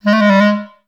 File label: G#2, orchestra; Best Service; Peter Siedlaczek's Advanced Orchestra